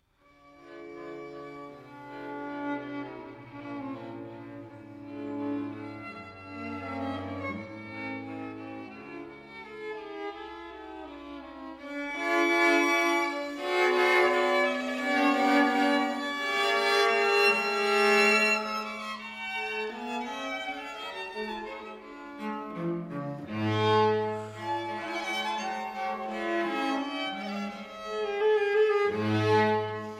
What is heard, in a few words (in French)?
quatuor a cordes no 15 en re mineur k 421 allegro moderato; wolfgang amadeus mozart